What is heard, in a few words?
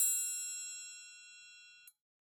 Triangle D Open Mute; Acoustic Ringtones